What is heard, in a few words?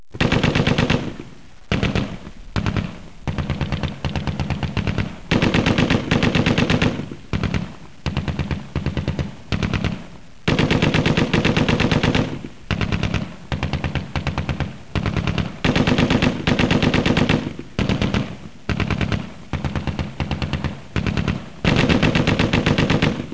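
machine gun; Gold Medal Software Volume 1; music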